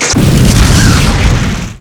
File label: electric explosion, labs; Half Life Model Pack; Half Life 2; ambient levels